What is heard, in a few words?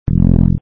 loop Laser; chip challenge